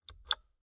Car Classic Bentley Eight Dashboard Electric Window Controls Lock Off; Transport